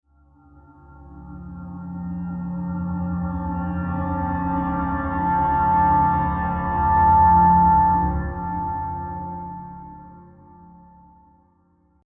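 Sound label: ambience metallic lost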